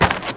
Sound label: dirt; svencoop